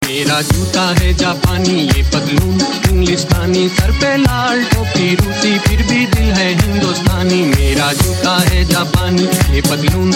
Indian Clubbin Remix

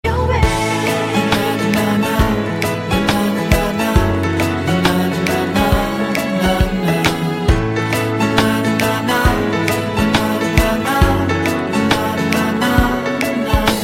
Piano

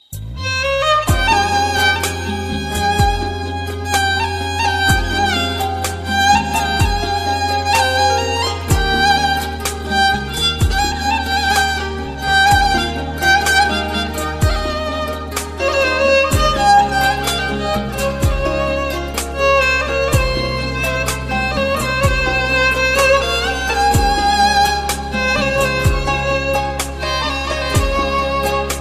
Classical music

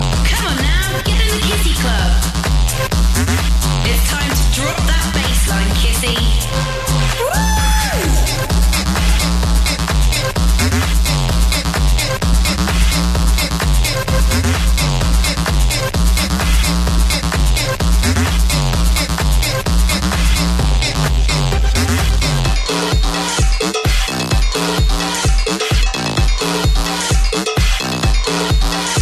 Hard Bass

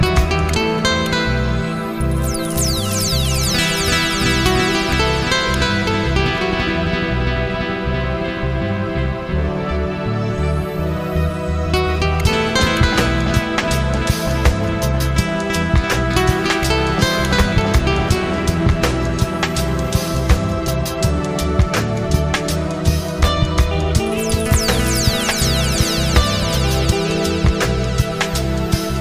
Relaxing Ringtone

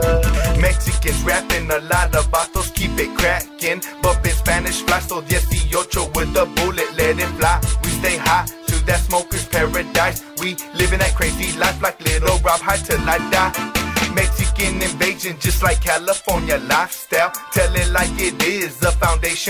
Chicano Rap

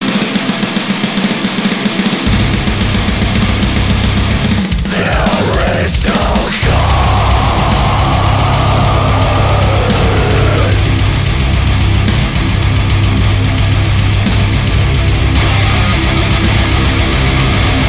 Metal Breakdown

